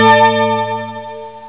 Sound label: Chord; How Many Bugs in a Box